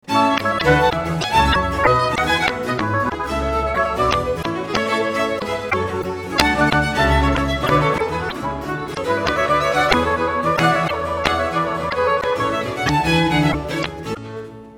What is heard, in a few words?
arrivee sheba